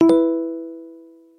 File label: Smooth